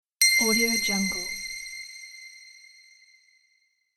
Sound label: bells sound